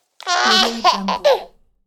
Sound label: baby laugh; human sounds